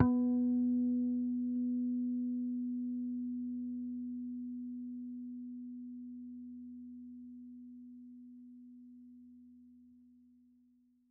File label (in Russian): chistie garmoniki dlya elektrogitari